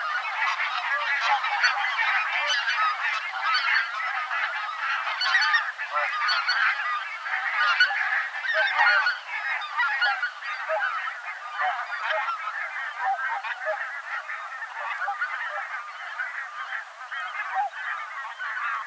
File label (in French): oie rieuse anser albifrons